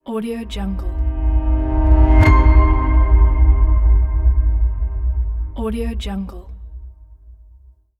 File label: cinematic piano